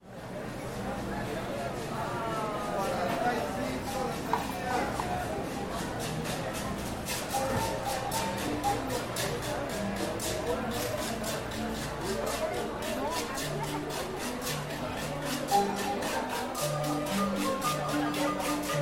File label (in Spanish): Mercado Marimba